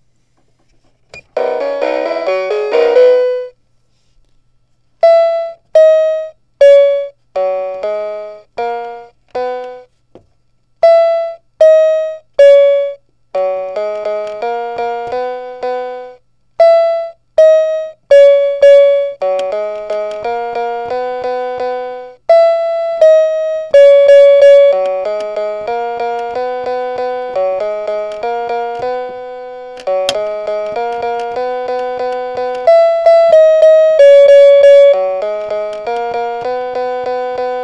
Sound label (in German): kleine improvisation fuer toy piano